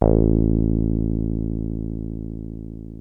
303 A1, TB 303, Synthesizer; Hollywood Edge; Giorgio Moroder Rare Synthesizer Collection

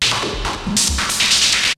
LOOPS, TECHNO; Syntec; Wall of Sounds; TECHNO-BOX

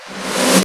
XV Perc, Roland XV-5080

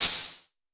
HH, Vermona Mono15 Drums

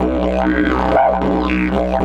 120, Didgeridoo

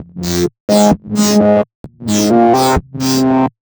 Nu Breakz, Underground UK House & Electro, Synths; Sonic Foundry (Sony Creative Software)

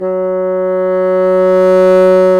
BASSOONS, Bassoon Swel; Roland LCDP04 Orchestral Winds; 90 s Sample CDs

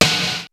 XR-10 K+SN+H, drums; AKAI; Rubber Chicken Software Sample Bank; DRUMS + PERC

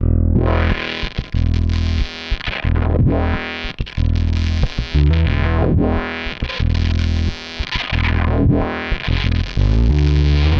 Delay Distort, Bass; Effected; Bass X

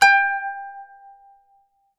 KANUN G5; SoundBytes; Early Patches; KAN SING BIG

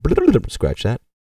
GOOFY, MALE HUMAN; ILIO; Vocal Planet

